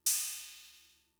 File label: Cymbal, Fricke MFB-522, Drum Machines